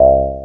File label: VELO BAS, Bass Analog & Synth, C2; USB Soundscan vol.12; AKAI; VINTAGE